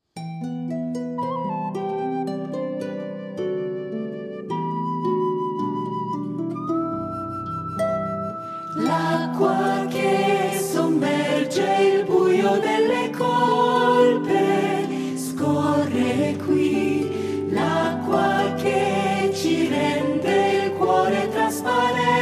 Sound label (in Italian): Canto dell'acqua